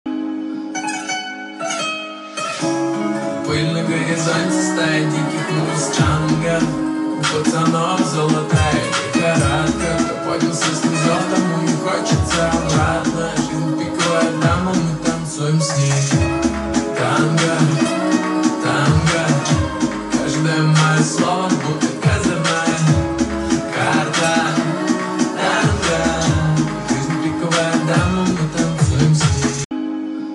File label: tango